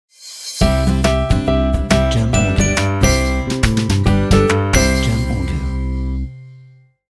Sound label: Positive Sunny Ident